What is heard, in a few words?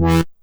Synth Hit E; Trance; Synth Hits; Zero-G Trance Inducer; 90 s Sample CDs